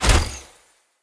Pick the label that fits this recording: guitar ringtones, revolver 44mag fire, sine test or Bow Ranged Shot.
Bow Ranged Shot